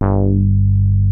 FAT BASS, BASS STATION; Syntec; Wall of Sounds

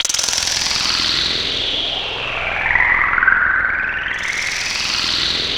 MOSQUITOS; Q Up Arts; Dream Experience

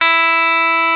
BB GUITAR E3; E-MU Formula 4000 Series Vol. 3; Analog Odyssey; VK Instruments

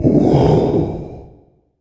IMP WHOOSH

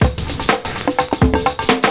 PERCS, 70 s Breakbeats

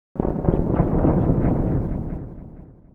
NOISE, Production Effects

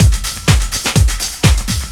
Deep House 125bpm

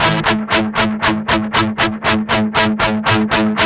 8TH A#3, Guitars; Spectrasonics; Hans Zimmer Guitars VOL-1; 128-JACKHAMR

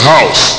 house; Amiga Soundtracker Sample Packs

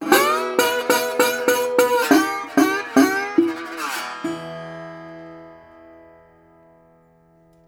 Blues Guitar Samples; ILIO - Fretworks

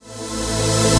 Misc Short FX

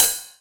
909 Ride; Novation Drumstation; Novation DrumstationV1

